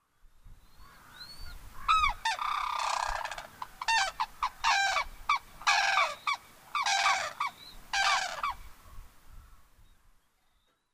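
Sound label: White-naped Crane, izumi; manaduru; koe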